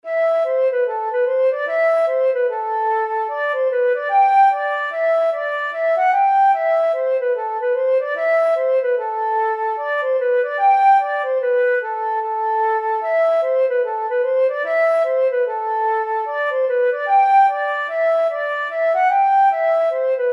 flute

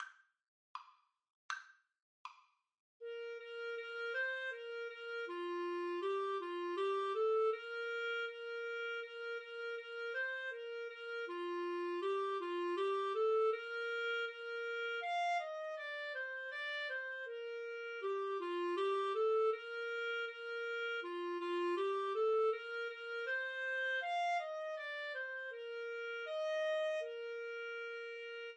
Clarinet Duet

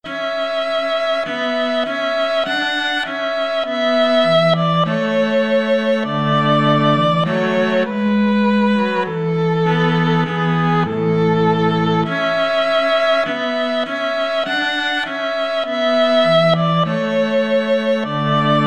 violin cello duet

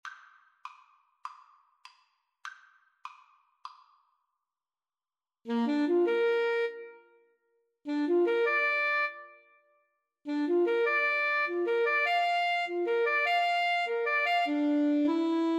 Trumpet Saxophone Duet; mendelssohn wedding march

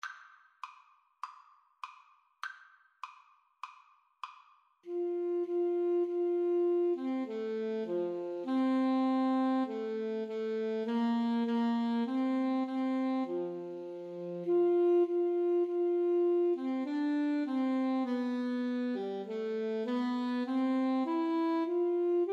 flute saxophone duet

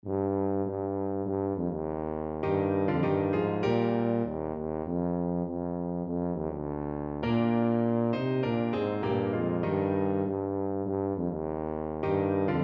brown girl, tuba

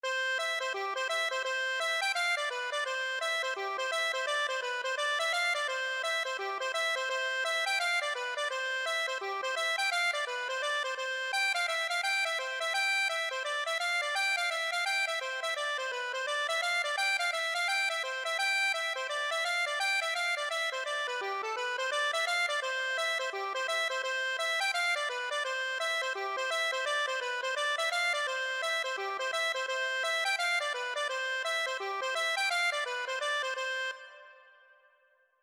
green garters, accordion

